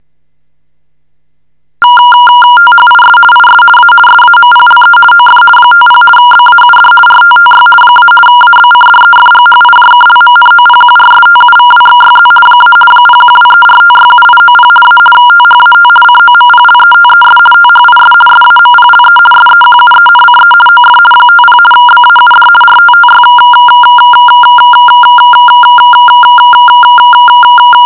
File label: RTTY 50 baud, shortwave